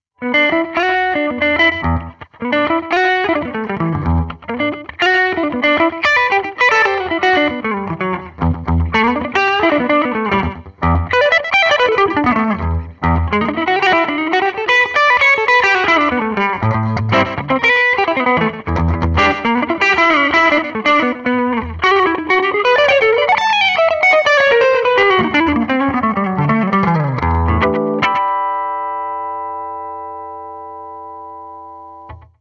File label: guitar play music; The Sims 2